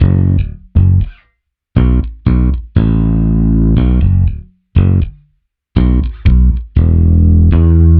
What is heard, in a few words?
Bass Guitar 120BPM F#m; 99Sounds x Monosounds; Instrument Loops